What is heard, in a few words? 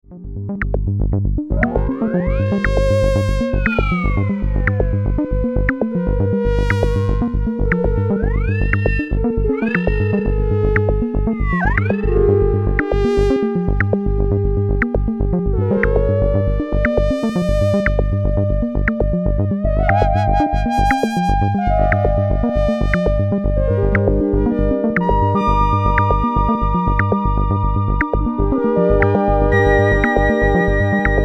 cs80 mix